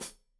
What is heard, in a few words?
Hat Foot, Hi-Hat, Cymbals Stereo